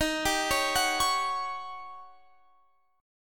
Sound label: Eb 7th Sharp 9th, Eb, Guitar, Open F; arpeggiated; x,22,19,20,21,20